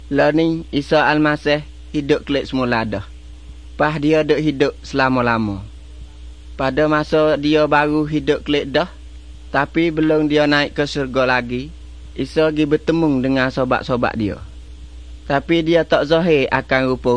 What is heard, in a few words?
songs malay pattani